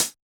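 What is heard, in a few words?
hi-hat Closed, Yamaha DJX 2B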